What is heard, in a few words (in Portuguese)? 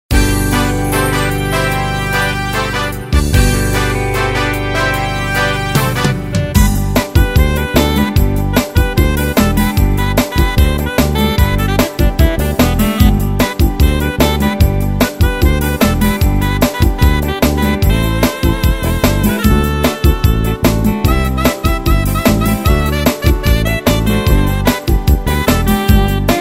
jovem guarda variacao introducao